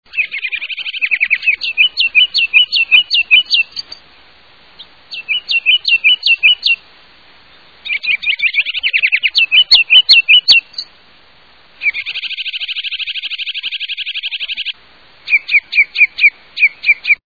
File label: Ruby crowned Kinglet